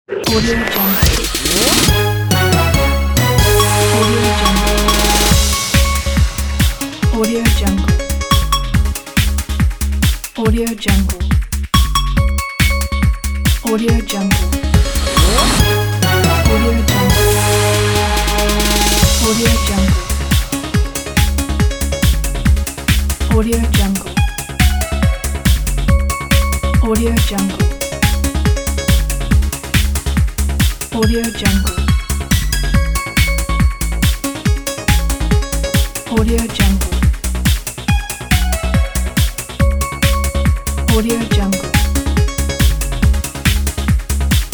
modern news headline

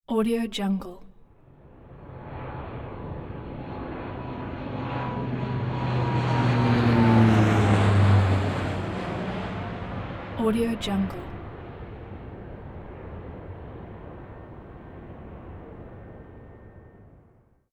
airplane pass by

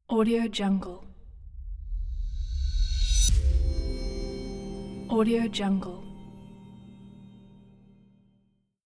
cinematic tone; arashdarehshouri

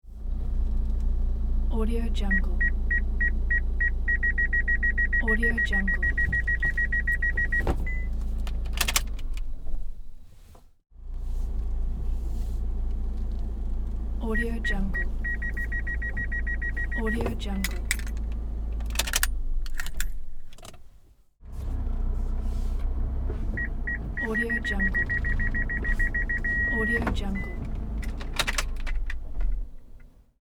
parking the car with beeping sensors

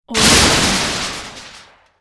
thin metal crash

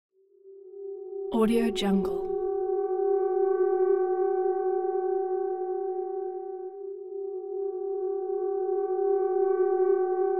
alien signal; ssalt

